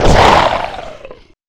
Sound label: death; player